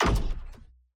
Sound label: door; Mindustry